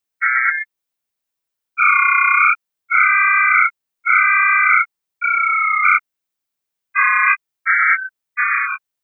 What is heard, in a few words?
mode 700D, freedv